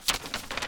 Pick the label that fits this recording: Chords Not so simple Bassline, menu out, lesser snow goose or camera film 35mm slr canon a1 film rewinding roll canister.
menu out